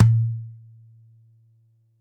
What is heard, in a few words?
DJEMBE, Yamaha DD-65